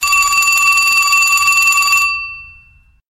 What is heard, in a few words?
Vintage Telephone; LG; A310